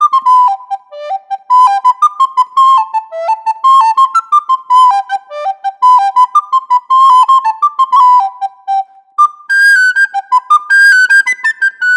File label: Yamaha YRS302 BIII Soprano Recorder; FluteShop